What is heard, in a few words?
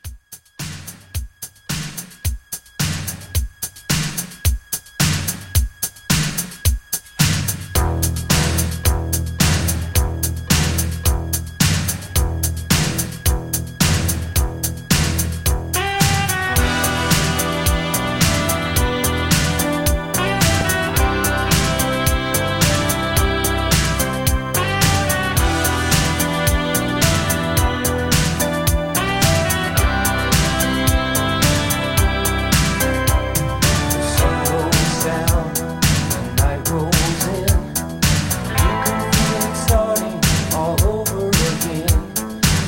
80s Pop Rock 109